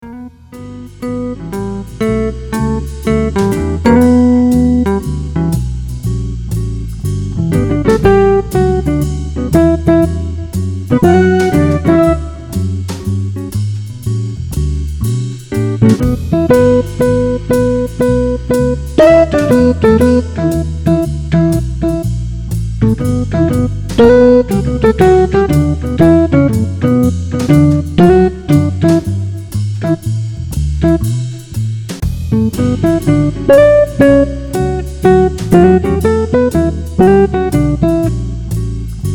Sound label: bebop jazz, smooth jazz guitar; audiolounge soft